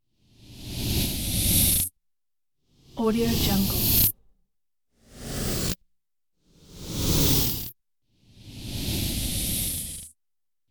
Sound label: vision transition